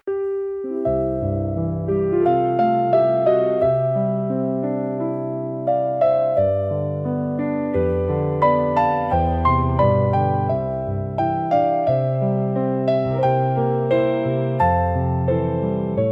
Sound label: piano solo ver